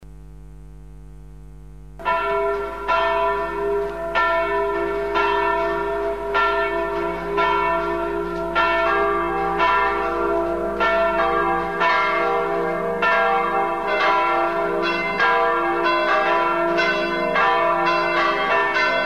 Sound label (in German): Kirchenglocken